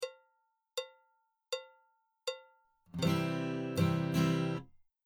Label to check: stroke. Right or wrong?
Right